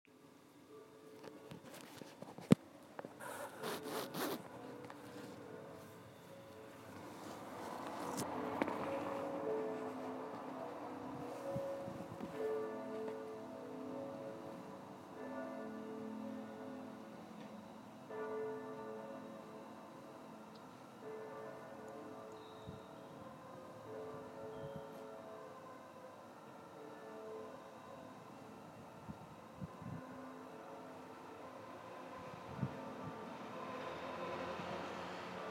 bruges trip morning atmosphere

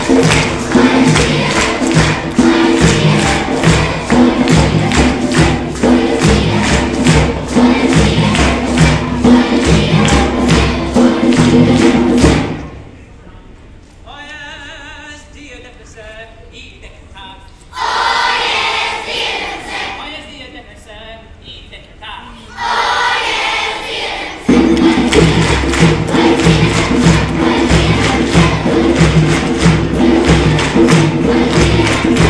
kids singing tribal beats

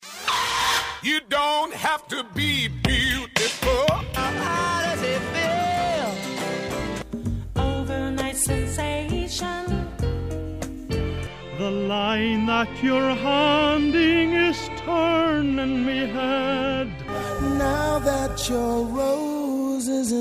Irish country folk

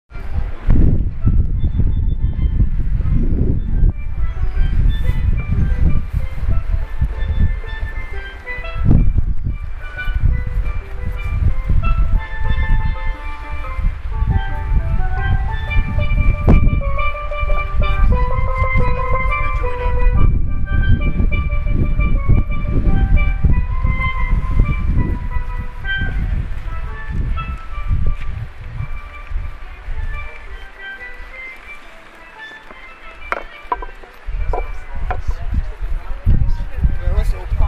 steel drum on windy Hungerford bridge

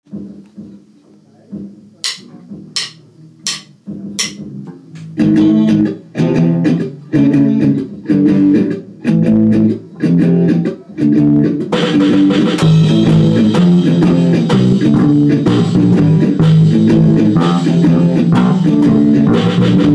riff

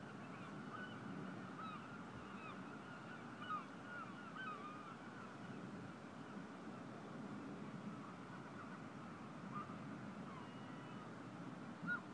alarm clock

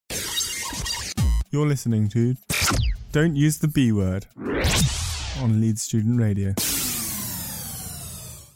jingle

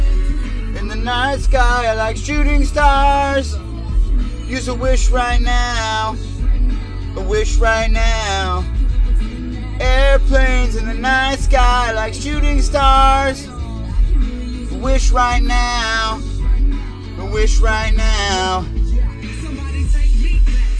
vocal warm ups professional

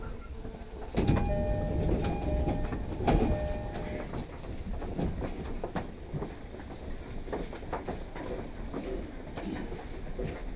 tokyo noise

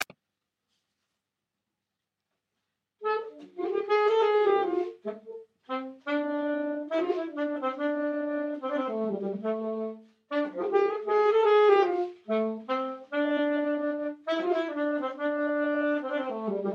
saxophone solo

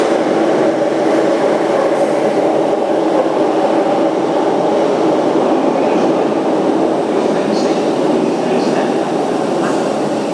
train sounds at Kings Cross station

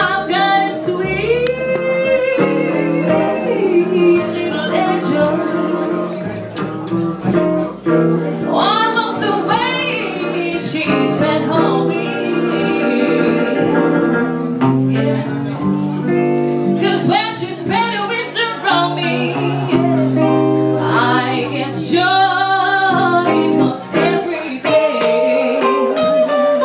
jazz

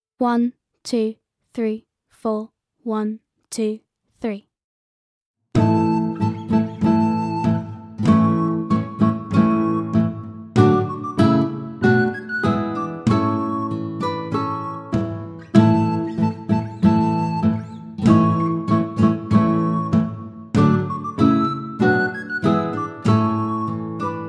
backing track

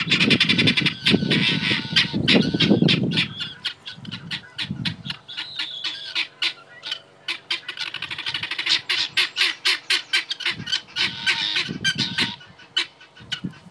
guineafowl

